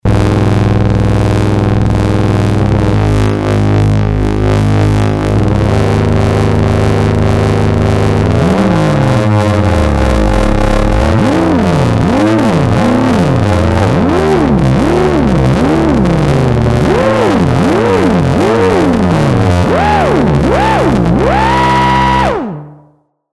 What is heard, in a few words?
Plan-B Model 25